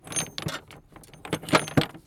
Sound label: Metal Chain Handling In Wooden Bin